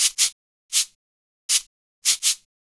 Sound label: Cabasa, Latin Percussion; Sonic Foundry; Joe Vitale Latin Percussion